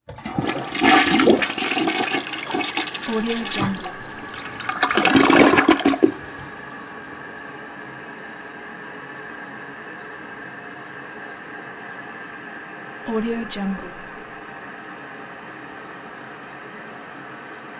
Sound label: toilet flush; prosoundfx